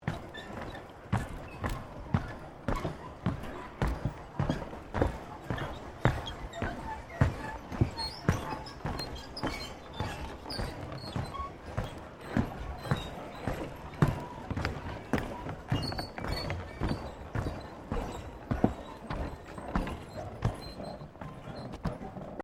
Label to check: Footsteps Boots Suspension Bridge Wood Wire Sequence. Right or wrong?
Right